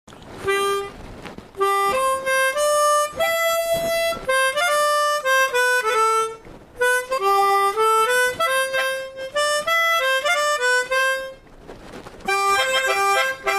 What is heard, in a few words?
Harmonica song